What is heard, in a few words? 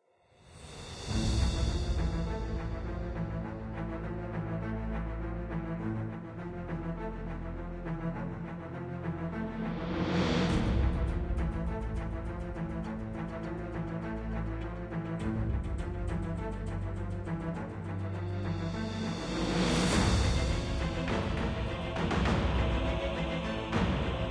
enemy move; devils demons android game music